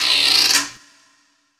Guiro; Essential Percussion

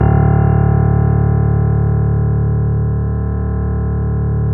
BOSENDORF

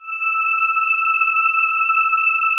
GIRLS CH, Synclavier Sampler

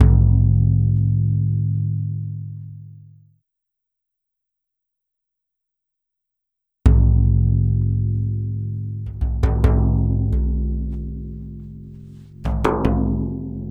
Frame Drum, Celtic; Sonic Foundry (Sony Creative Software); Continental Drift Aftershocks; Percussion

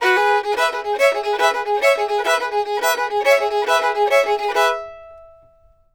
FIDDLE; Sampleheads; Whole Lotta Country 1 & 2

